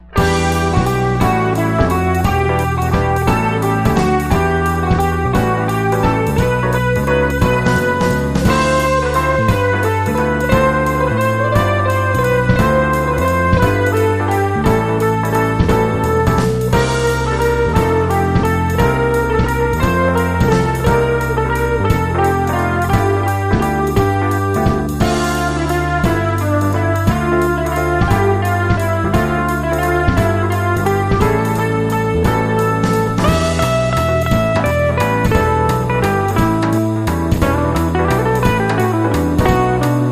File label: Blues